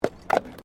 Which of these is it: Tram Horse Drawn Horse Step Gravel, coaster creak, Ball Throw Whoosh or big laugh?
Tram Horse Drawn Horse Step Gravel